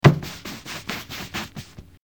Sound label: soft brushing impacts on floor